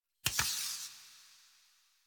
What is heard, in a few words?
Tarot UI Card Slide In B; Warframe; Harrow; Tarot Emotion Choice